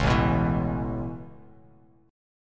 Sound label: A Major Add 9th A Bass Standard, chord